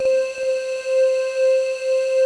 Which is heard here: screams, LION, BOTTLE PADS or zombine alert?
BOTTLE PADS